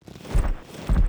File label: WAR WALK